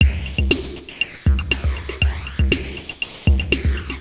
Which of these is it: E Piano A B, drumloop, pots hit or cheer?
drumloop